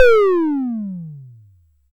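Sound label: SYNTOM HI P, analog toms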